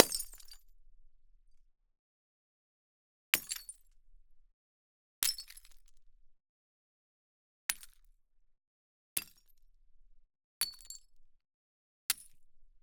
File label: shards mono impact glass shatter small; sonniss gdc